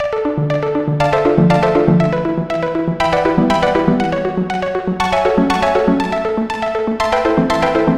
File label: synth arp; Arpeggios; Electrocution